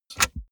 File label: car bmw e46 interior drink holder take bottle soft